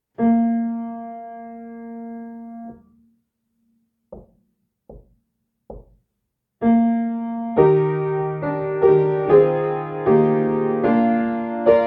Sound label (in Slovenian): klavir; Primorska poje 2025